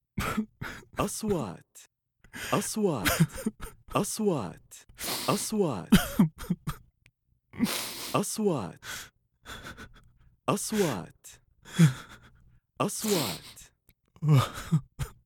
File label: MAN CRYING